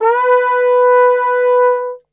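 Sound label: B 3 trombone glissando, Brass Super Section; Best Service; TRMB GLISS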